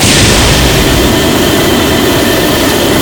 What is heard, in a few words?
flame thrower start; tf2